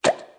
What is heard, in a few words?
sucked up